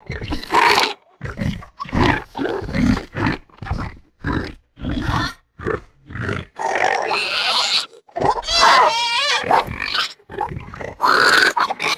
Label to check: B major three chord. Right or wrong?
Wrong